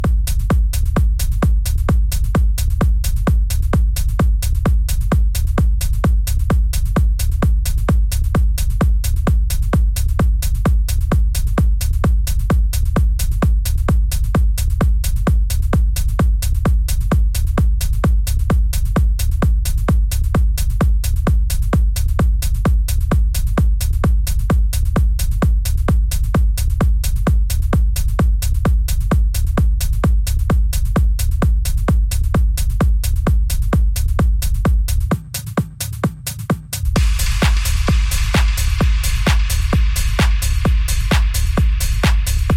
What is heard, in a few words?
techno live sets, techno set; nj usa